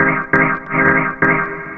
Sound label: Synth, Techno